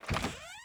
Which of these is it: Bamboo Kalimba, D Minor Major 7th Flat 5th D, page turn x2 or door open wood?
door open wood